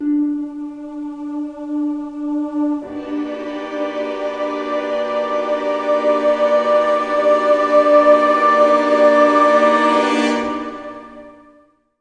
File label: Drone